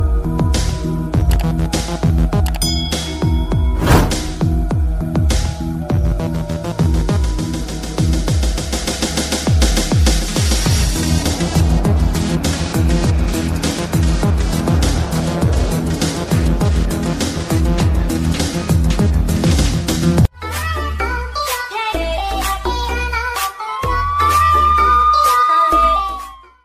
english sweet; english ringtones

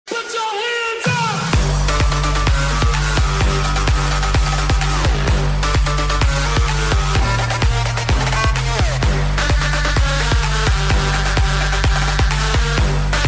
electro mash ringtone

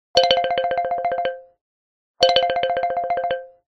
lg velvet 5g uw sms ringtone

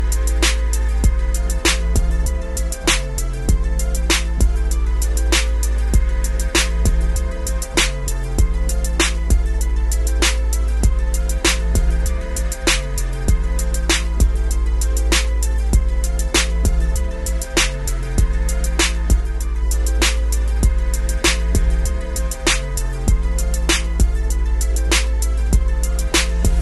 bass boosted english ringtone

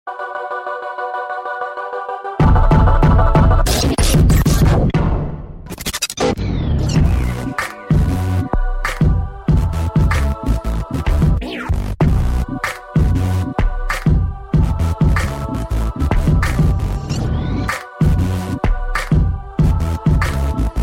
mix ringtone; pepsi smash

